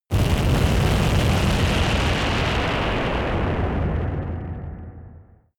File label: massive blast strike